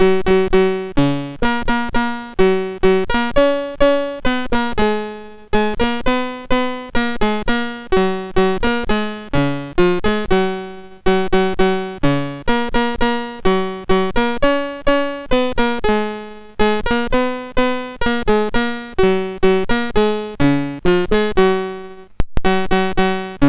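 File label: Prospector BASIC, MOS 6581 SID chip music, games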